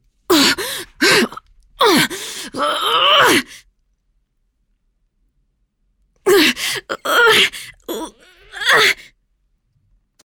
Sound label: efforts